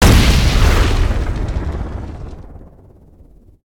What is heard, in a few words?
Rock Throw Impact Swt FLAME; Evolve; Meteor Goliath